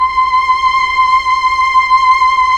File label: STR, Melo. Violins, Slow Violins; Roland LCDP09 Keys of the 60s and 70s 1